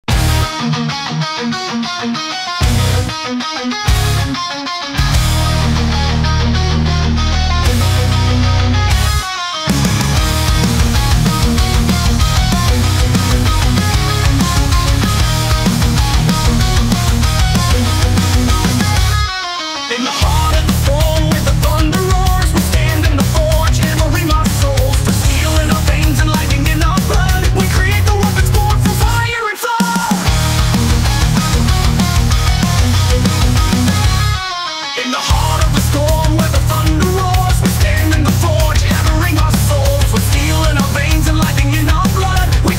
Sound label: heavy metal; official heavy metal video